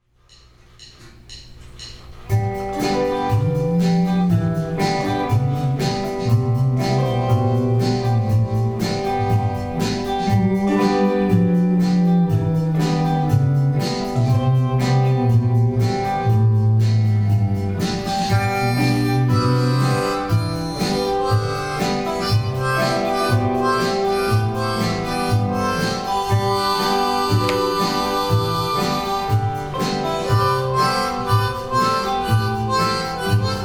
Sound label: harp solo